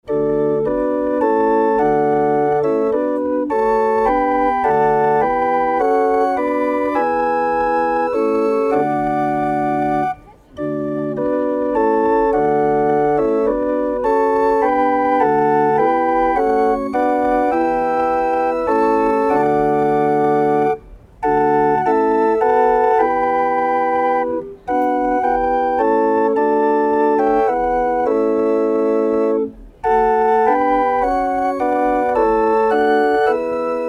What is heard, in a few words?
hurdy-gurdy